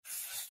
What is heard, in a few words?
smooth pencil